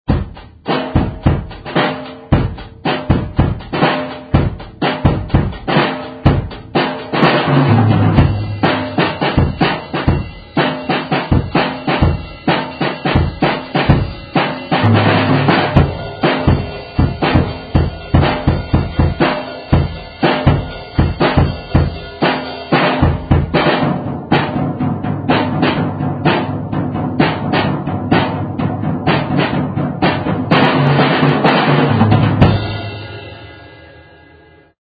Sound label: Jazz Drum